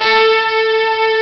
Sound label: strings + trumpet, Amiga Soundtracker Sample Packs; ST-XX Amiga Soundtracker Sample Packs